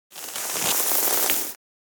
Polyester movement scraping

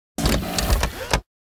Sci fi gadget ready to use

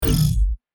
Action adventure game menu ui tab switch

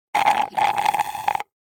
Cartoon slurp; cartoon eating

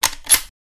Mauser 98 sniper rifle reload

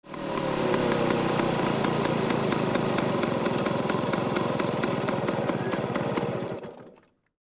City scooter idle and stop

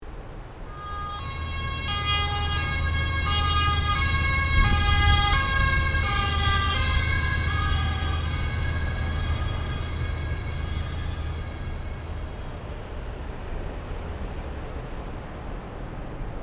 Ambulance emergency pass by siren on

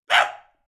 Small dog puppy single bark

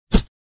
Punch swing

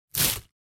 Cardboard tearing

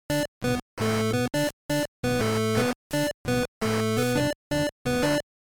Retro 16 bit or 8 bit success melody win victory

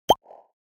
Futuristic pop up

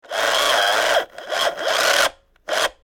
RC truck steering servo movement; rc toys

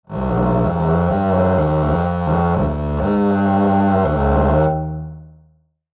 Double bass awful play string scratch

